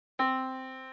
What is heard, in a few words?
yamaha Disk